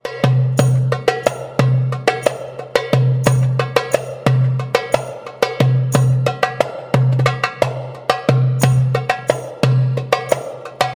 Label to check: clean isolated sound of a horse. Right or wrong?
Wrong